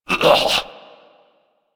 Male Death Gurgle; Enemies; Feral; Kubrow; Grineer Finisher; Warframe

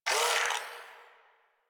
Shadow Vocal Grunt; Warframe; Duviri

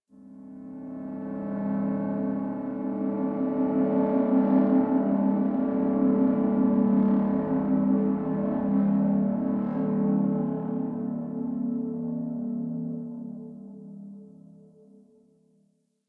Gritty Pulsing Drone; Warframe; Ambience; Nineteen Ninety Nine

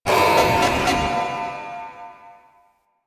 Swarm UI Scanner Jammed; Warframe; Entrati

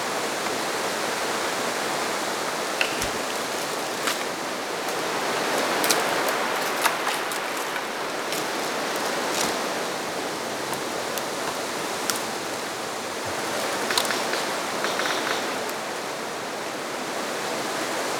Tree Leaves Wind Loop; Trees

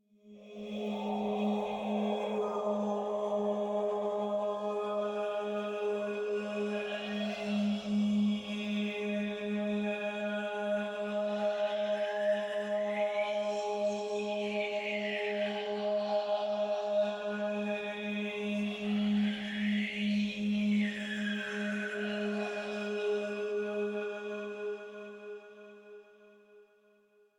Choir; Warframe; DE; Sentients

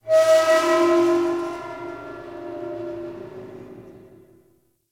Settlement Metal Screech; Ambience; Warframe; Grineer Settlement

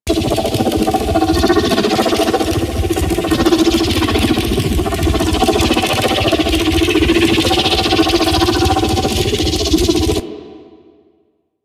Orphix Shield Loop; Warframe; The New War; Sentient Devourer

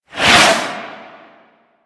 Tank Missile Barrage Whizzby; Enemies; Abilities; Warframe; Lasrian

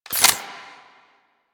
Reload; Gotva Prime; Warframe; weapons

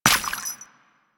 Steps Water, footsteps; Foley; Warframe; Grineer Royal Jester

